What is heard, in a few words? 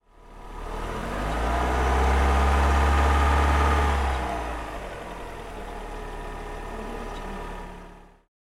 old petrol car accelerating